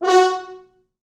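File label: French Horns staccato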